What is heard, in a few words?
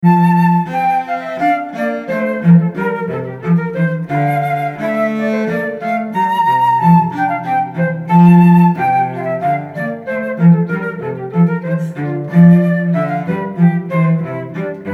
Hornpipe from Water Music flute and cello; by Handel; Christen Stephens